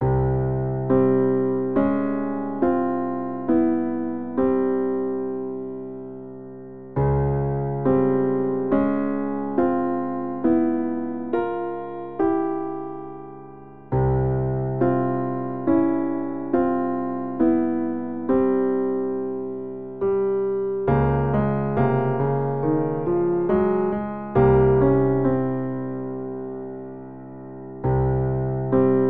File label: Piano; The Legend of Zelda Ocarina of Time